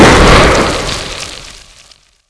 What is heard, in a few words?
plas exp, weapons; quakemapping; mod quoth